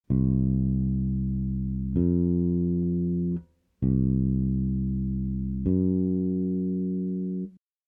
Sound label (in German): reine - übermäßige Quarte, Bass; Bass Unlimited